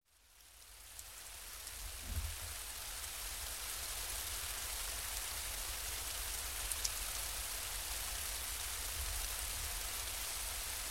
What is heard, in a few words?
Light Rain On Pavement; Sound Ideas; Series 2000